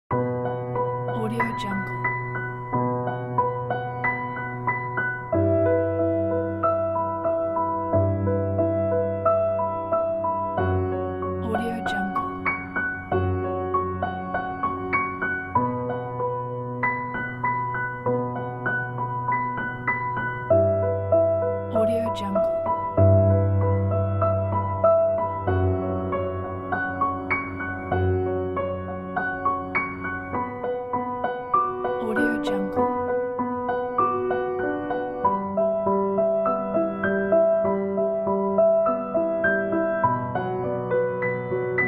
sad piano background

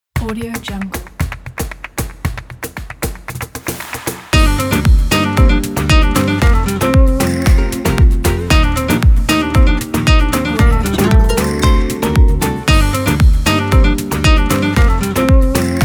modern flamenco

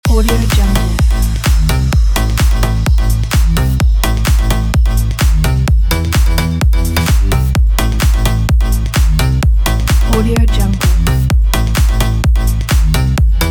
electro loop

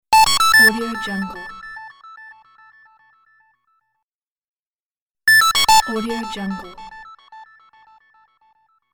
message sound; soundfoodsfx

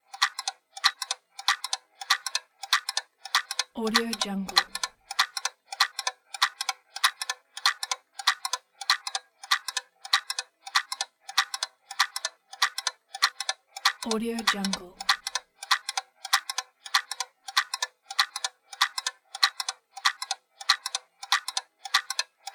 clock ticking

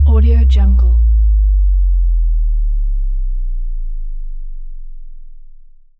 low bass drop

